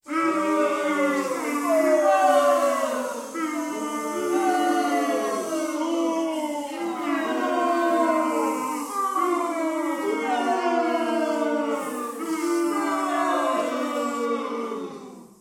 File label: fieldgoal croud boo; 2fast2curious